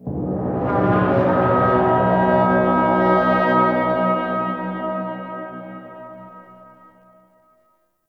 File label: Officer Stinger; Interrogation; Dax